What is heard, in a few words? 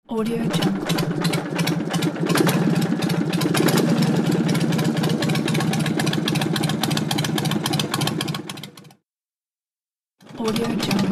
idling of the motorcycle engine